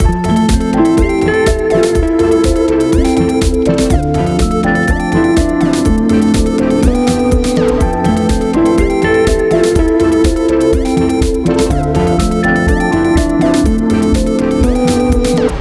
Chalet Remix

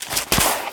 concrete fall; Golden Crayon Sounds

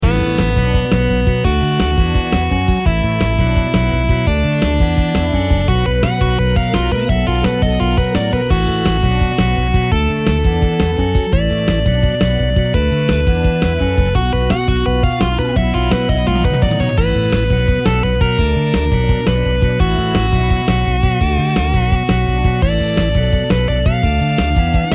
battle; Manamon Music; Manamon 2

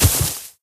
brush land; Golden Crayon Sounds

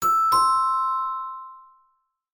doorbell; Golden Crayon Sounds

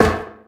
pipe step; Golden Crayon Sounds

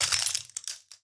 broken glass step; Golden Crayon Sounds

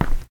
tile step; Golden Crayon Sounds

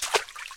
water step; Golden Crayon Sounds

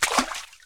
water land; Golden Crayon Sounds

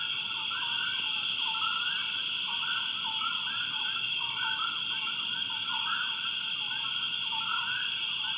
swamp; Golden Crayon Sounds

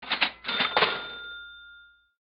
get cash; RS Games Sounds; Monopoly

